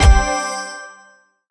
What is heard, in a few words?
stop bonus; Amatic; Book of Fruits